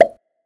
Popping

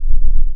Deep Rumble

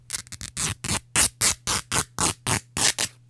Textile Tearing

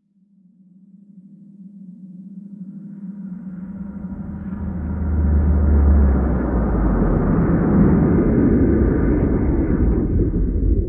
Huge Spaceship Pass

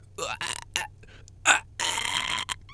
Gagging

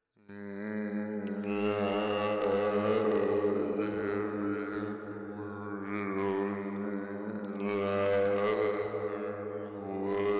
Dark Ambient Sound

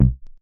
Goa Bass

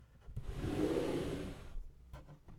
Sliding

